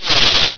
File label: STATIC